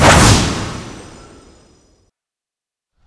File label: sword bombard skill